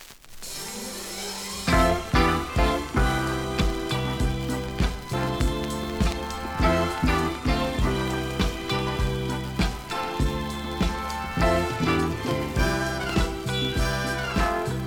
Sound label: Jamaica Islands Modern Soul Disco Sweet Soul Stepper